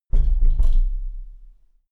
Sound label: closing door gently